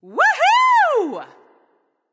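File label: yay, female; smite; voicepack; hera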